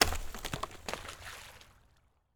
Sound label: Liquid Tree Branch Rolling Into Running River